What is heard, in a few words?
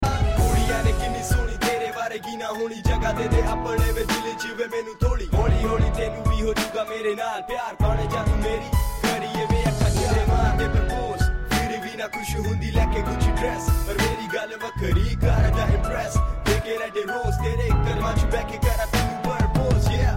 rap, hip hop ringtone